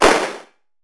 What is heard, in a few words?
sfx explode; Dight